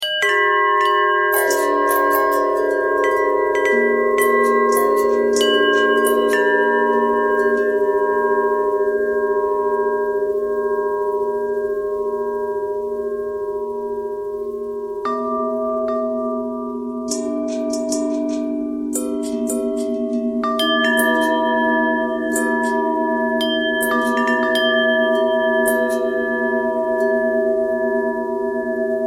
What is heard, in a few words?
long bell bell thread noise, bells